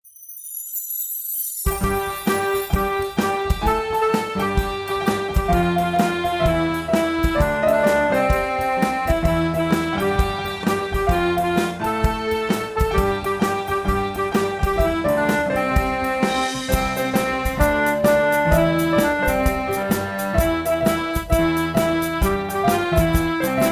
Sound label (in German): Lied instrumental; Koenig Drosselbart